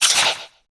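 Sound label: dwarf axe weapon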